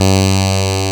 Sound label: PPG 2.3; DIGITAL1 HYB